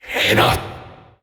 Possessed Red Veil Vocal Attack

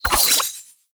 Spectral Sword Slash Start B; Ultimate Abilities; Naramon; Warframe; Focus

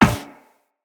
Run Metal Perforated, footsteps; Operator

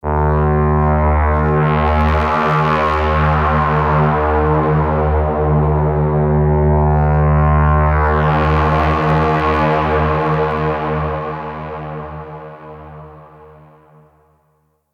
Castle Distant Dark Horn; Ambience; Midrath Island

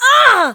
Pain, Barks; Kuva Lich; Persona B

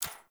Menu Hover, UI; Warframe; Awakening Remaster

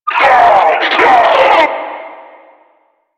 Death; Warframe; Lasrian; Lasrian Enemy B

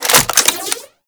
Reload; Warframe; Latron Prime

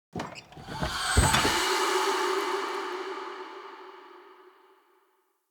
Chest Open Uncommon

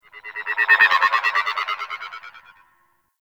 Exterior Distant Space Calls, Ambience

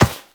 walk metal, footsteps; Warframe; Characters; Operator

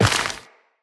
Step Dirt, Footsteps, Walk; Tenno

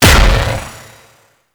Spear Gun Explosion; Warframe; Corpus

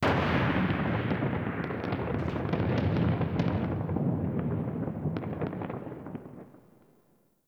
Ambient Distant Explosions; Warframe; Nineteen Ninety Nine

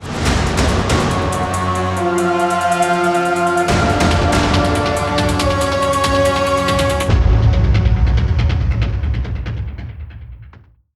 Mission Complete Success Cue; Zariman; Warframe

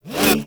Bullet Jump Pre Land, Vehicles Motorcycle; Warframe; Motorcycle Ninety Nine

